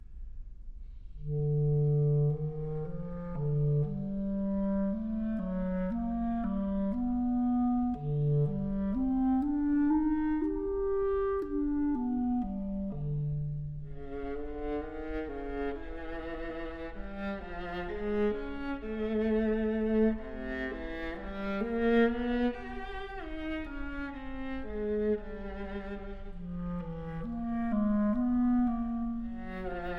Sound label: Trio for Clarinet, Viola, and Piano, Largo; Bruch Trio